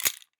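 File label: gun bullets in magazine; nova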